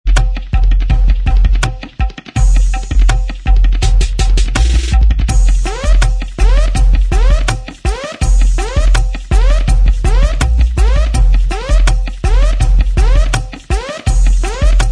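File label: Inst Track, instrumental track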